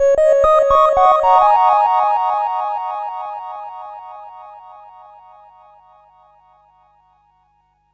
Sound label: Chime Loop